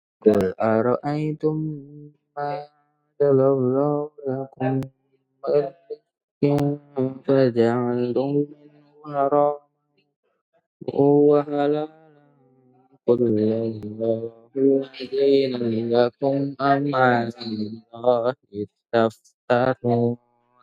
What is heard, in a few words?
bas